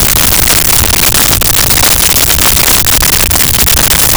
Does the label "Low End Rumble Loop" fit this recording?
Yes